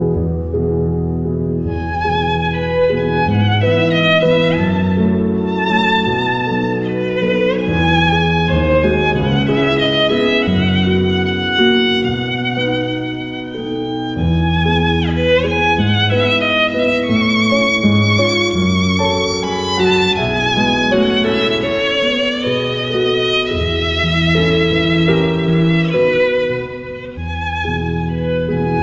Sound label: piano